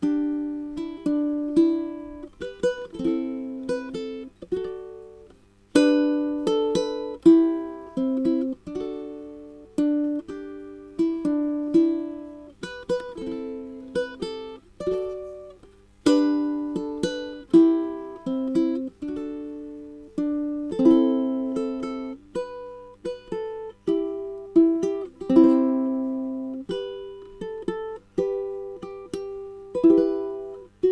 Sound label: schottische ballade, ukulele